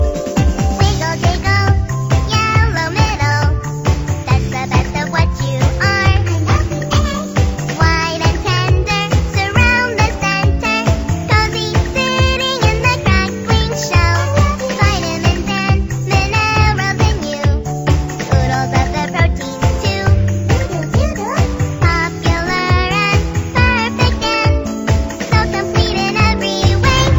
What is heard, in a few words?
baby cutest